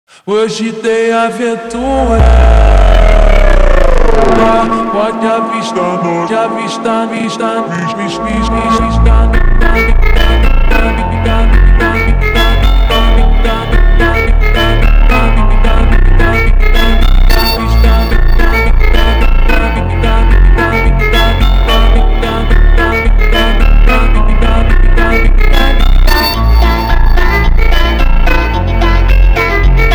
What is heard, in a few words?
Super Slowed